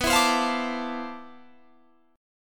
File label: C Major 13th B